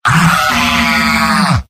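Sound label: demoman pain critical death; mvm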